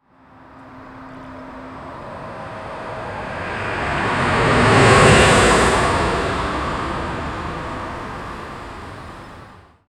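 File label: New Flyer XN40 natural gas, bus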